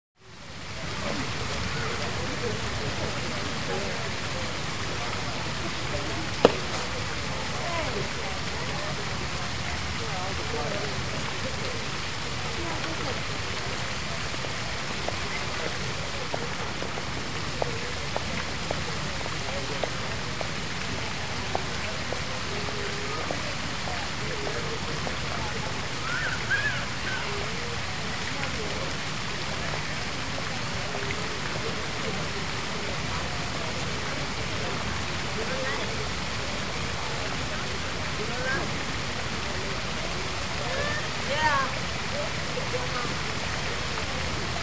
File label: Fountain In Park, Wide Perspective. Constant Water Rush With Some Splashes And Gurgles. Kids Play And Run By. Sparse Adult Walla Close To Medium.